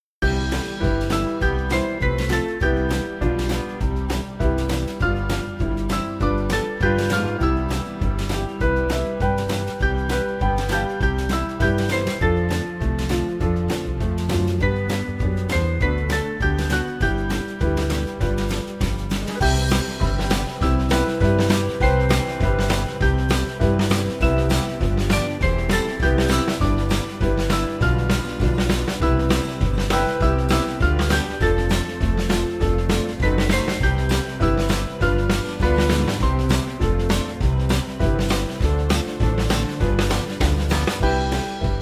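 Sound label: Band, Music Backing Files for Churches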